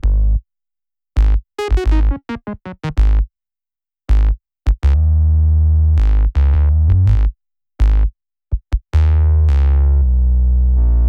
bass, Smooth RnB, Ebmin; Big Fish Audio; Legendary; Happiness

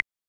Wood, Acetone Rhythm-King

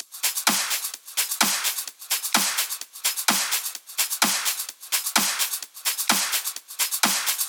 Loops 128BPM, Drumloops

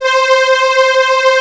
STRING WAVE; STRINGSYN; Alpine Volksmusik

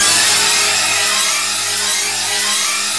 G#3 TREM; Northstar; The Wizard VOL-1; SCORPION

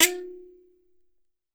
KALIMBA; E-MU Producer Series Vol. 7 – Old World Instruments

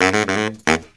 Killer Brass Riffs, BARYT; USB Soundscan vol.29 - Killer Brass Riffs; AKAI

